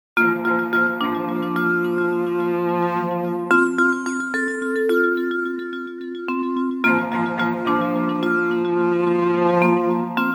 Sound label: Vibraphonic